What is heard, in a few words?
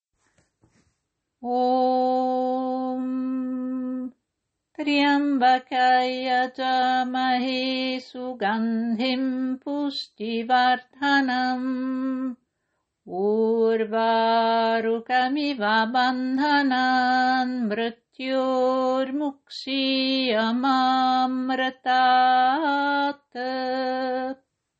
Tryambaka mantra